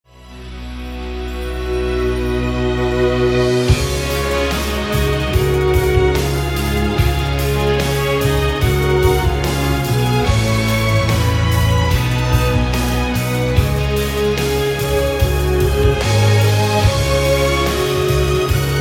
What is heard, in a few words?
Quintet; Madoka